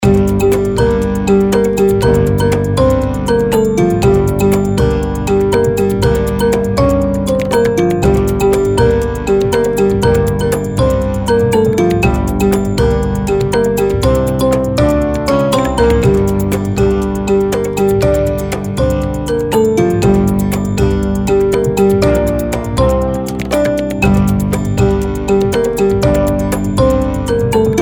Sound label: ethno